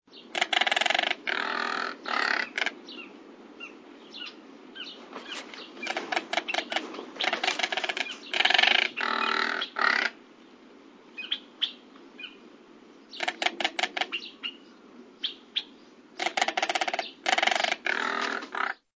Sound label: Crested Caracara